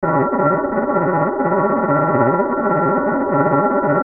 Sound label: Surface Roughness